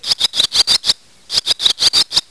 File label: cheep